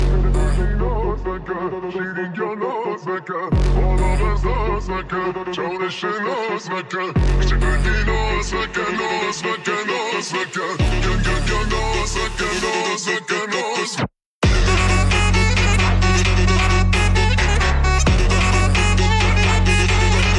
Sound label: Remix Phonk